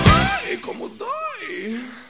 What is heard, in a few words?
knife